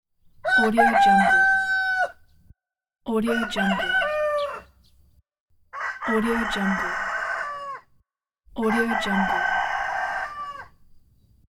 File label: rooster